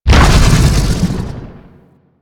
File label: Biped Vocal Death; Warframe; Man In The Wall